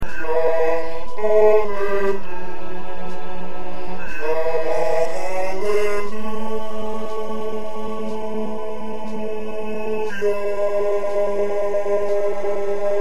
Bass

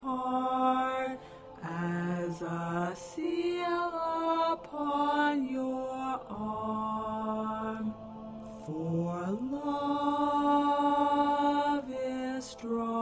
Tenor 1

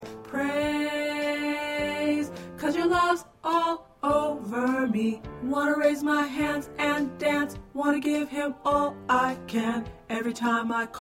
Tenor 1

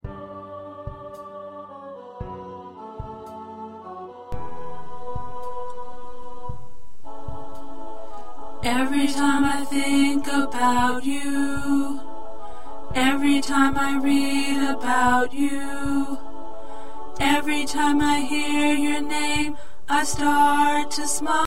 Lead Group; gospel songs

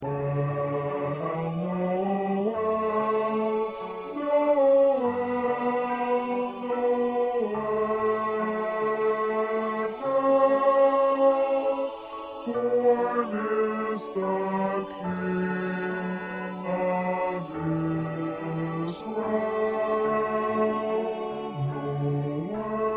Bass

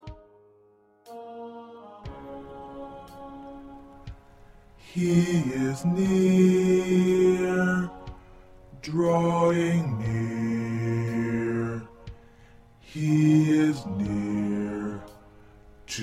Bass

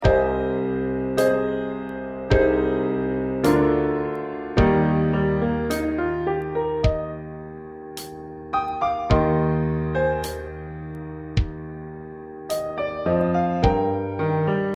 Piano Drum

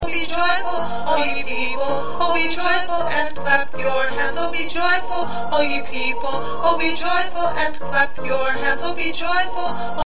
2nd Tenor

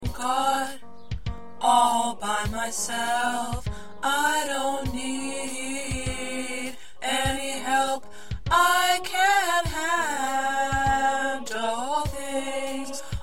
Tenor, choir; PRACTICE TRACKS

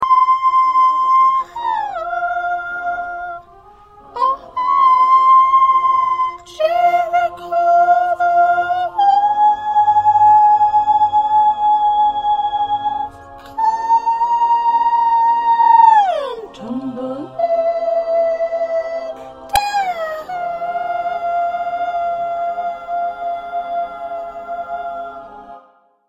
Soprano 1A

